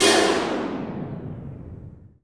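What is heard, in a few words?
POWER SLAM; Northstar; The Wizard VOL-1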